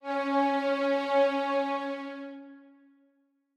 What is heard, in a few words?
Strings start